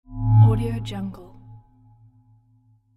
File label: ice cave scape